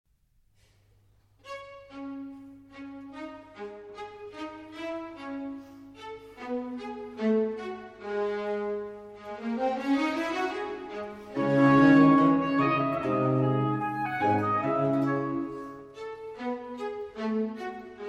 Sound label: Concerto in D minor Andante e spiccato; concerto venice the golden age